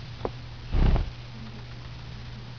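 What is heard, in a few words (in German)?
gefiederschuetteln, wellensittich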